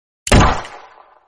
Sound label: gun sound effects